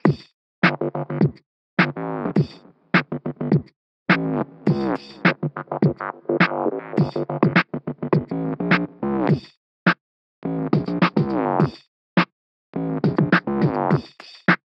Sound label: BoomBox loop